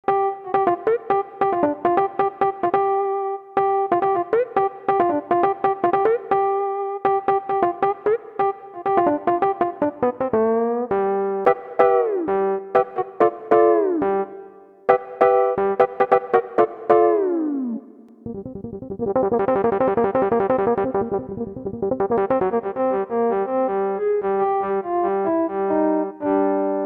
Sound Canvas Rhodes, continuum

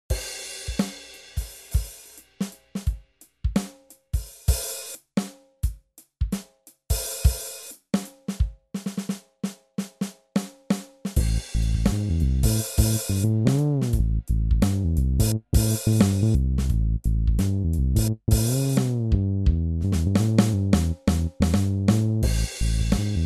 Funk Loops, continuum

